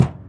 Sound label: Ticket Counter Perc; Moody Park; Houston; percussion